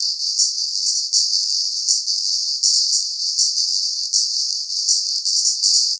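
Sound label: Master Loop, beats; Sonic Foundry (Sony Creative Software); Esoterik Beatz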